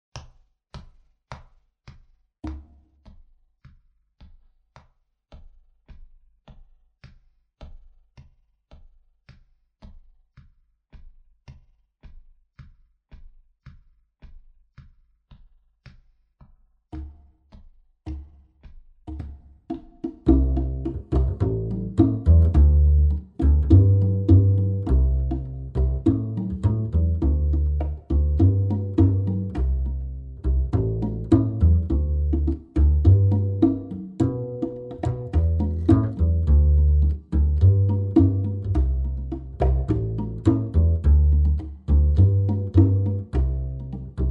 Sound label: minus piano